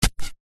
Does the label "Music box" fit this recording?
No